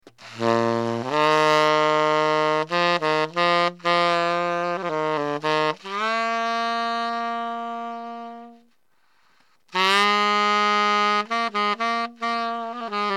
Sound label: body selmer sa80